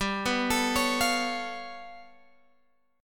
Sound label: G 9th Flat 5th, Guitar, Open G